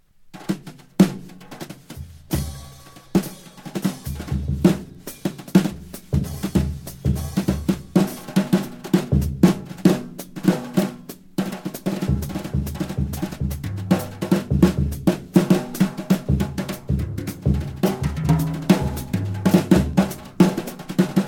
african drums

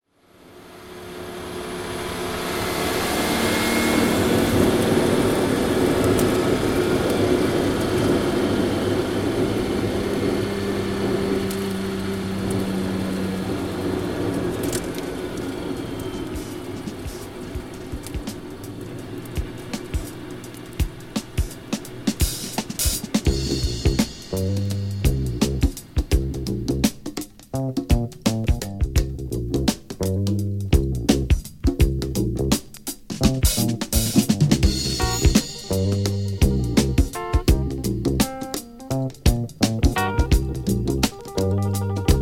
porsche 934 turbo; the supercar

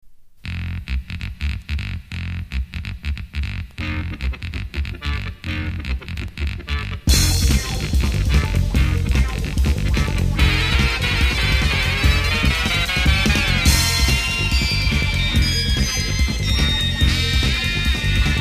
electro keyboard orchestra

